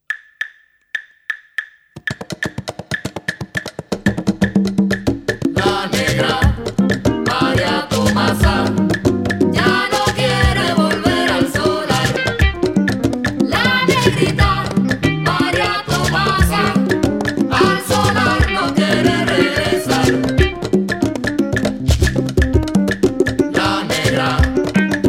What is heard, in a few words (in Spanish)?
Rumba